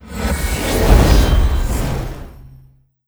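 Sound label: boss dragon death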